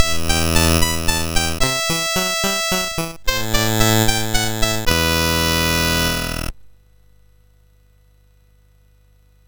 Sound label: sid MOS6581R4; Dark Lord